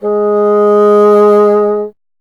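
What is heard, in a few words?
wind bassoon G#; Miroslav Vitous - Solo Instruments VOL-1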